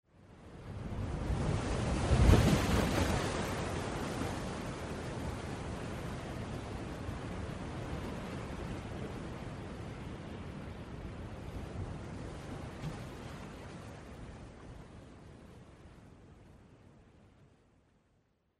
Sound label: Wave